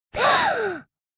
Surprise gasp girl child kid

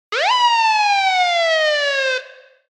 Police car siren short burst quick

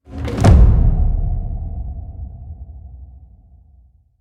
Tribal drum impact cinematic hit

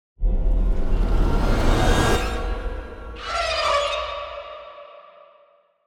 Suspense logo intro effect ident; halloween

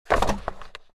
Book falling into wood box

